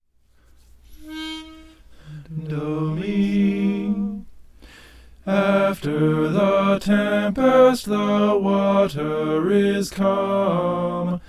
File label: soprano